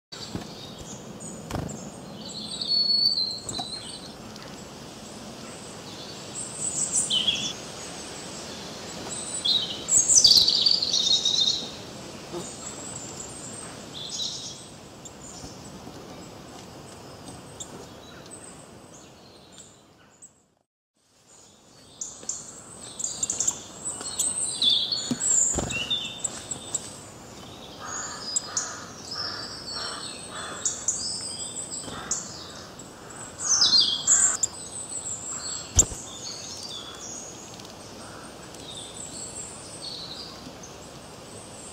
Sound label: peaceful ahh sound